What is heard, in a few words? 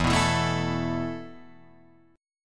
E Suspended 4th E, down, Guitar, Open F; Esus4 strummed